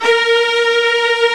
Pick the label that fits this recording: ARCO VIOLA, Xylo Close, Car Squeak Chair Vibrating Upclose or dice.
ARCO VIOLA